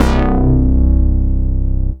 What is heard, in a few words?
BASS C2, OBI BASSES; Zero-G Ambient 1